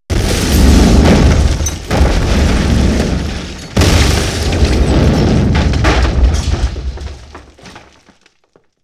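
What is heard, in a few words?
5.1 explosion, centre channel; Sony Pictures Sound Effects Series; Explosions - 5.1 Surround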